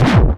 Ruff Scratch; Digital Sound Factory Xtreme Lead

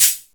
drm G#4, DRUMCOMPUTER, EMU SP 12; Best Service; ProSamples vol.55; Retro Sampler; EMU SP 12+1200

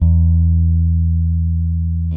Whole Notes E, Bass; Construction Kit; Bass X

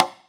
Conga; Equipped Music; Loungin House

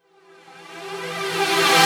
Reverse Synths Emin, Effects; Vengeance - Essential Clubsounds Vol.4

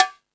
Latin Layers, Drum Machines E-MU Pro-Cussion

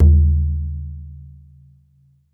BODHRAN, Celtic Percussion; E-MU Producer Series Vol. 7 – Old World Instruments

